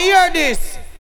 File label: VOCALS; Ueberschall; Jam Box